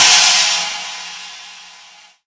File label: big china cym; Cymbals; single hits